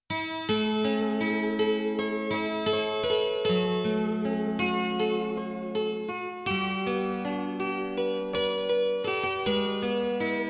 spa music 432 Hz; zamyslenie

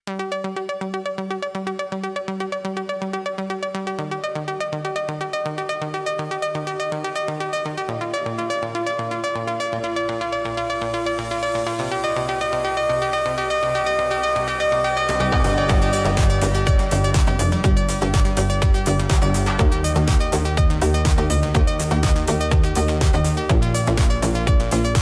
Electronic Impulse

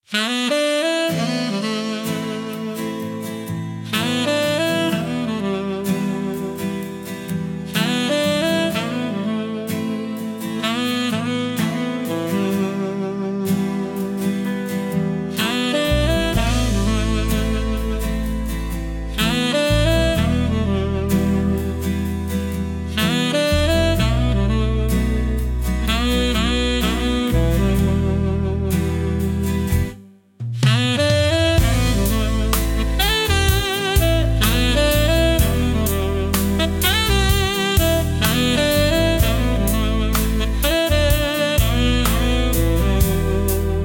midnight sax

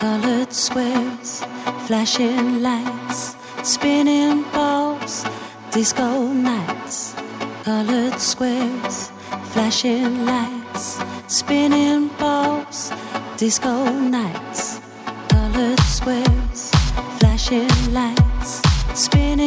happy music 432 HZ

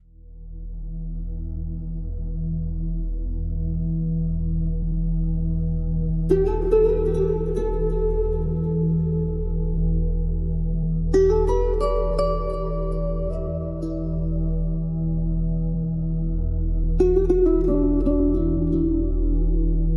Soothing Strings

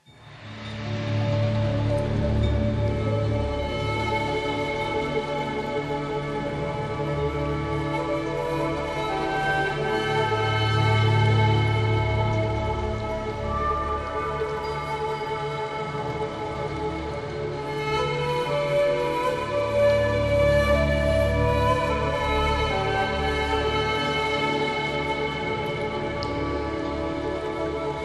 Chinese Meditation

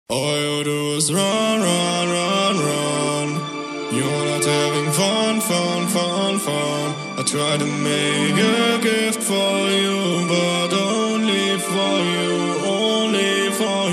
Disco Chill Vibes

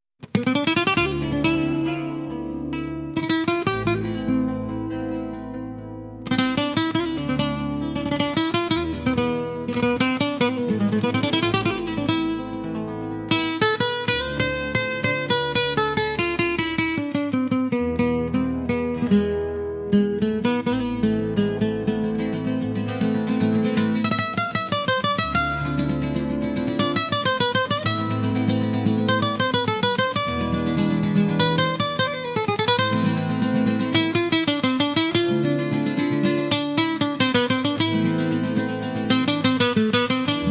flaming strings

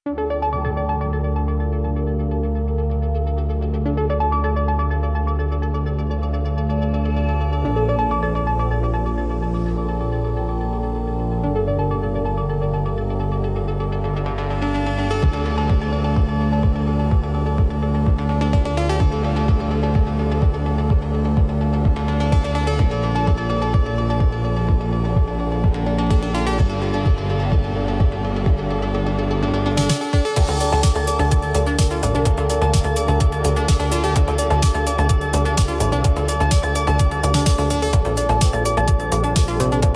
Electronic Impulse

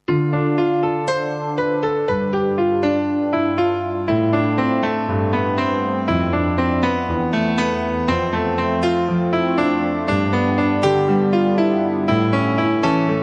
ambient with nature

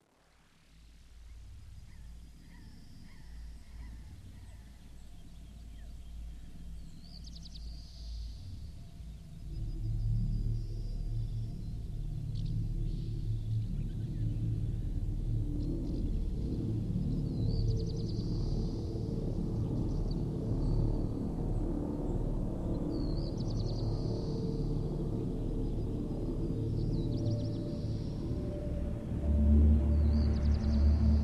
ambient with nature

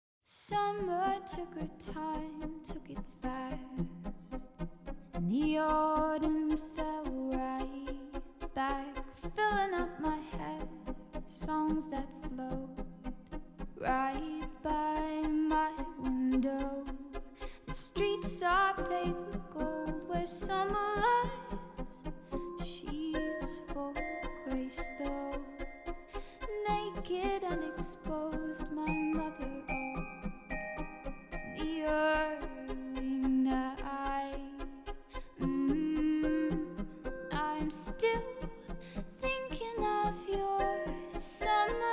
vocal chill 432 Hz

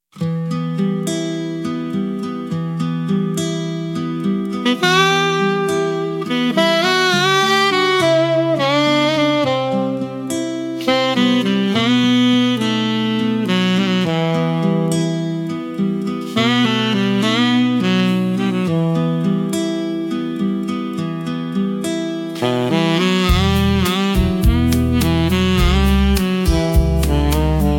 sax serenity

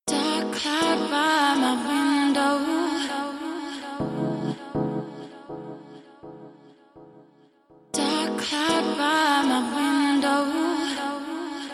vocally 432 hz

